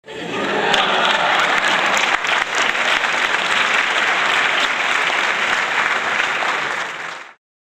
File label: applause